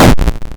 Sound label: explosion; Sergio Valor; orni attack